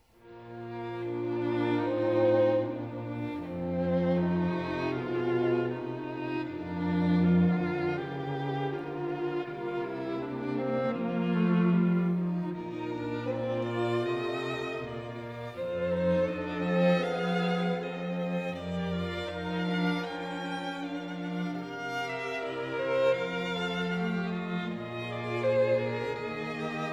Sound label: String Quartet in D Major Andante con moto; String Quartet No. 3; Op. 18 No. 3; Ludwig van Beethoven; Beethoven - String Quartets Nos. 1-16